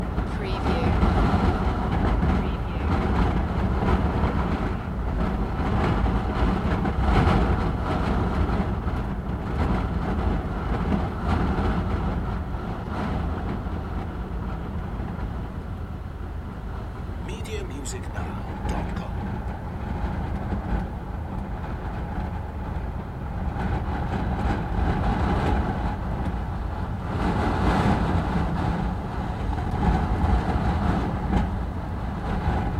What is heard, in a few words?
Strong Coastal Wind - Inside perspective; beanstalk audio